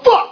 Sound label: blip